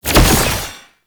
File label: Fist Claw Spike Despawn; Incarnon